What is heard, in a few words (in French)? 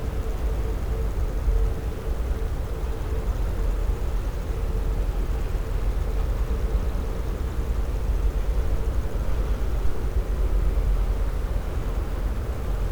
cachalot negatif, Physeter Macrocephalus